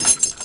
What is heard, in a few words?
Pickup Key; Pickups